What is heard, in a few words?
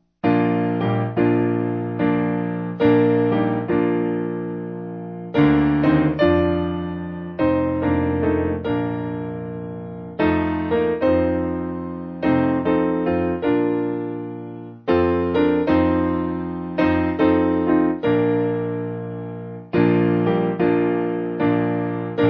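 Piano, Music Backing Files for Churches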